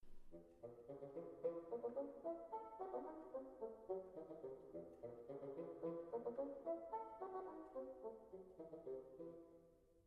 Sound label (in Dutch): fagot